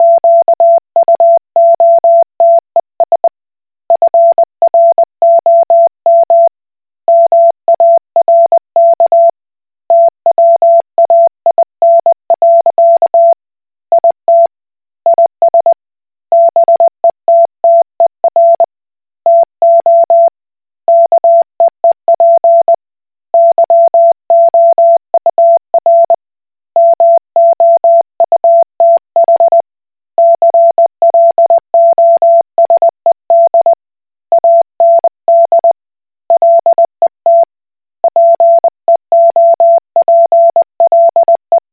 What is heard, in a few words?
Koch Morse